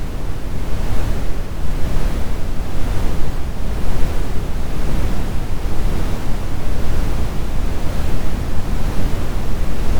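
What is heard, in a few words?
36.7 dBA 5 dB Modulated Brownian noise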